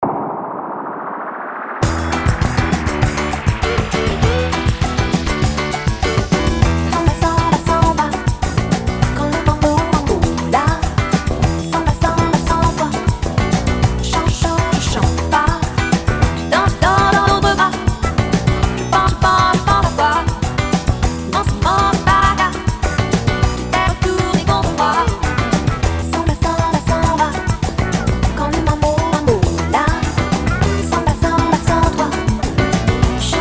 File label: Samba Mambo